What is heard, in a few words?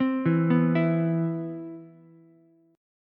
C Major 7th, C, down, Ukulele; CM7 arpeggiated; JGuitar